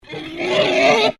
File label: Animal Pig Landrace Screetch; Edgar; Edgar's Mission Farm Victoria; Pigs; Animals